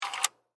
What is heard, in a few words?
Hotel Door Auto Lock, Foley, Hotel, Door, Automatic, Lock, Motor; Miscellaneous Door; Miscellaneous Doors; Blast Wave